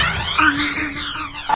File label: Electro FX; Ueb HE Electro FX